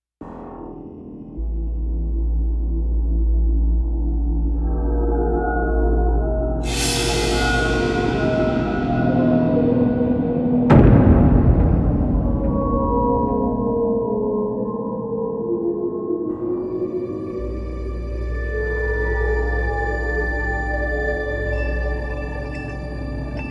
TENSION AMBIENT LOOPABLE

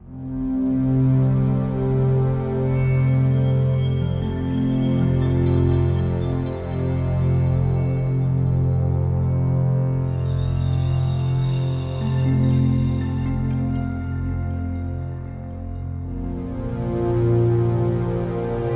Ambient

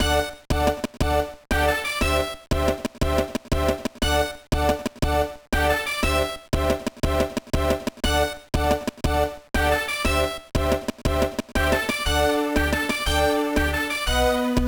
BGM; Daisenryaku 2001; GBA

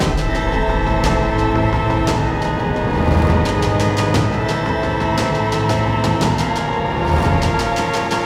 Dialog Danger Loop; Skykeepers; PS4, Windows, Xbox One

